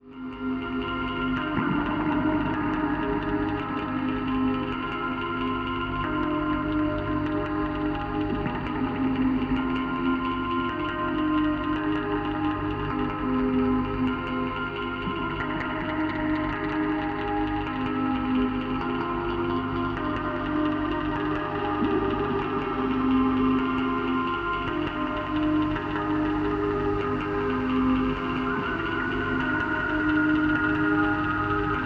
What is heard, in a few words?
fall